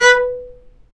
STR, Chamber Marc; String Master Series; Combos 4

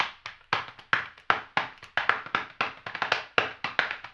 HAMBONE, Vocal Blues Soul; Spectrasonics

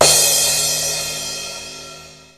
Crash, EMU Proteus

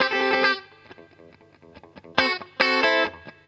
GTR, Roots Funk; USB Soundscan vol.41; AKAI

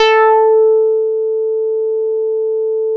A4, Bass; E-MU Producer Series Vol. 6 – Analogia Project; Boom da Bass

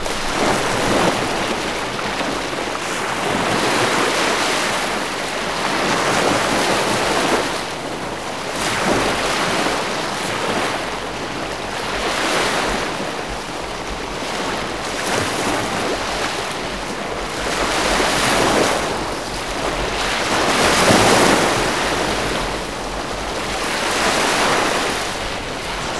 SEA, SEA-WATER; Best Service - XXL Production Effects

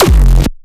SB hard style

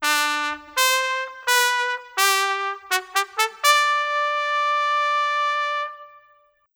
Trumpets, Cinematic; Sonic Foundry (Sony Creative Software); Orchestral Series VOL-3 - Cinematic

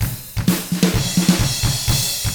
HARD ROCK, Drums

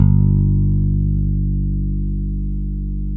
FBASS, Electric Basses; PlatinumPhattCD2; E-MU Producer Series Vol. 8 – Platinum Phatt

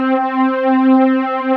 CHORUS C4, KORG, Analog; USB Soundscan vol.49; Analog Fantasies; AKAI

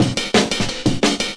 Breaks 175, Drum&Bass; EZRollers

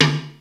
DDR30 CT; Crypton - DrumBase5000; RZ1

